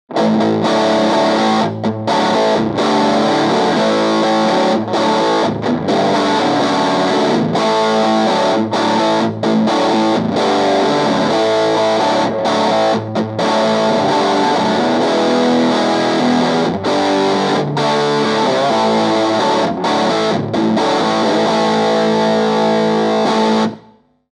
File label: Crush 12 Overdrive